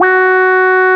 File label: QUAC, QUACK SOLO, Synth